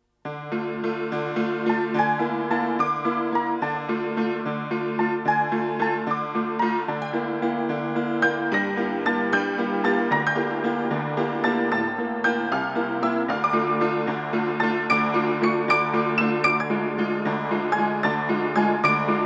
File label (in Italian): solo pianoforte